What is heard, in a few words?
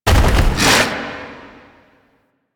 Containment Protocol Wall Build; Warframe; Warframes; Qorvex